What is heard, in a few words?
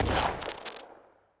Lightning Dash, Combat